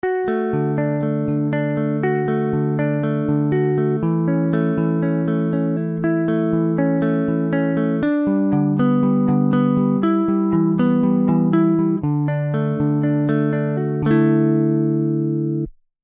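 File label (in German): arpeggio pur; Les-Paul-Modell; Session Guitarist Electric Sunburst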